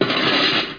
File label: Sound silver